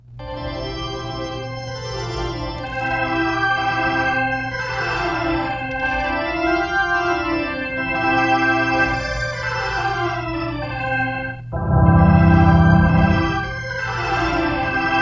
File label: organ; preset ReeVerb